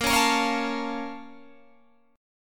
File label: Bb Minor Major 7th, Guitar, Open G